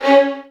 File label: VN FX, Virtuoso Strings